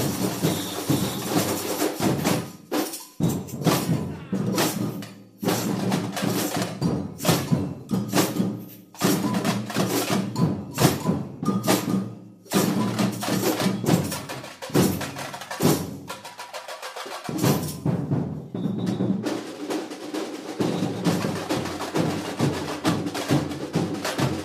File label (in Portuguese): Break Ao Vivo; Sao Clemente; Bagunca